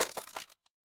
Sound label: impact crash